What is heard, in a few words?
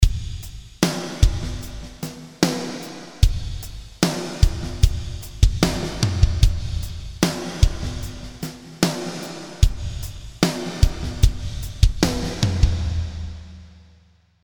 Drums PreDelay